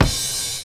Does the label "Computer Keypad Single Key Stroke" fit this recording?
No